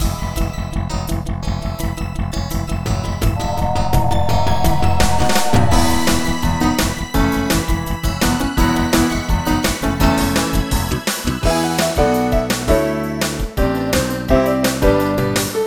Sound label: Game Win; 3DRMDemo; MIDI Music File